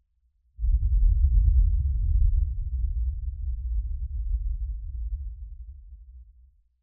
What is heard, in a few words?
Explosion, 5.1 Surround